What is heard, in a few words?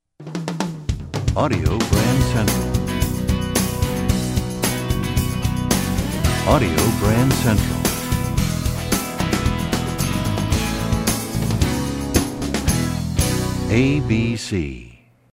Desert Wind, radio jingles